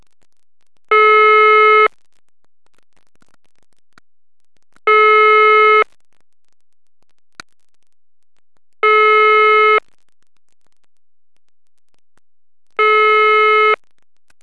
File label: russian ringing, telephone